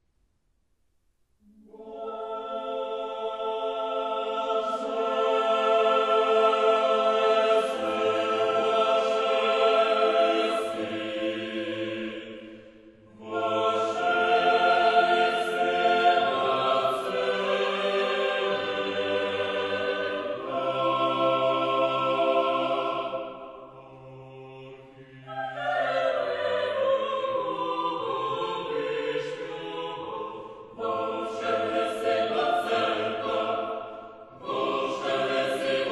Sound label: concerto for two choirs, Kyiv Chamber Choir; Dyletsky Mykola; Came Into the Church